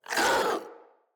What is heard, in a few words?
Infested Charger Pain